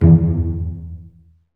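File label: STRINGS SECTION 5; Roland - LA Composer vol. 1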